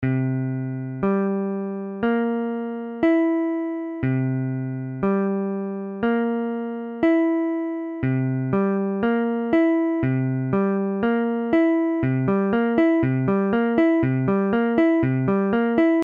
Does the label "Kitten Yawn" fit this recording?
No